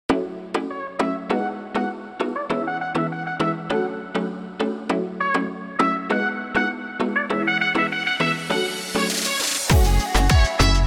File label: the afrobeat